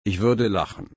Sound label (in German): lachen